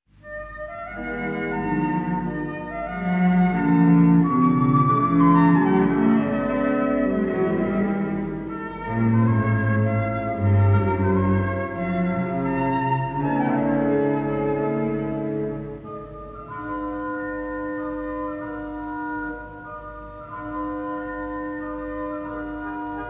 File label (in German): Orgeltrio